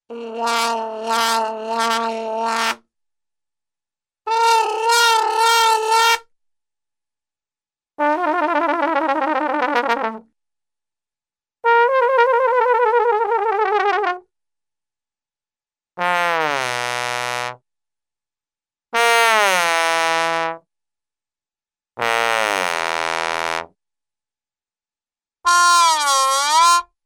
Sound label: Muted Trumpet & Trombone Blasts; Cartoon; Hollywood Edge; Cartoon Trax